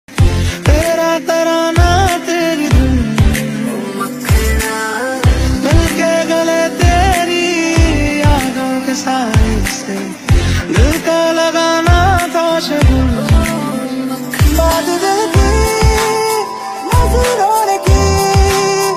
hindi ringtone trending ringtone bgm ringtone iphone ringtone